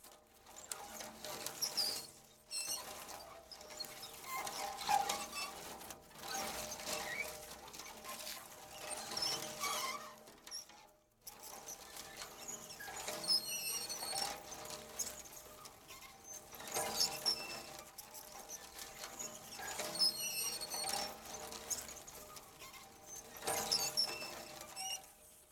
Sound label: dirt plants scrape windows; Blind Drive